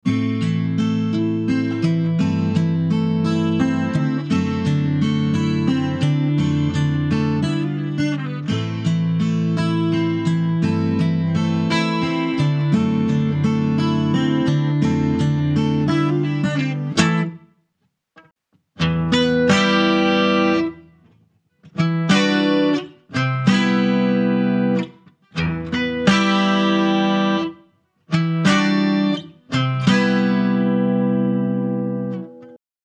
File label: Martin D 28 new; James Tyler Variax; Après MàJ